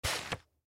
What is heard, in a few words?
Magazine Stack Set Down Wood; Paper Sound Effects; Materials; Foley